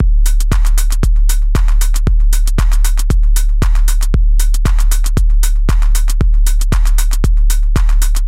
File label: dub techno loops loop